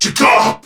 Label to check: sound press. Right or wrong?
Wrong